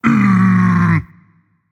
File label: Tongue, Dragon; fafnir